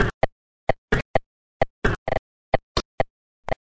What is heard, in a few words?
131 glitch oops; LOOPING AMBIENCE